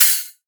drum hat half open; Virtual Riot; Drum Hits

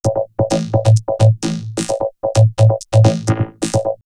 Vocoder Loop; backtothe70's; X-Static Goldmine; VocoderLoops

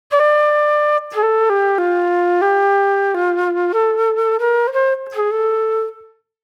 Reflective Flute

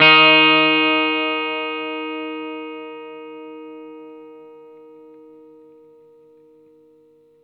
GUITAR NOTE EF, Vintage Blues Guitar; USB Soundscan vol.22

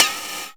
percussion, Dance Synths; Mad Kit

